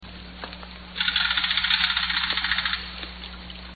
jack hammer

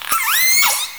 call, Orca; SRKW; Alwin; S38

